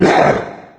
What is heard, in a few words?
mancubus pain; doom3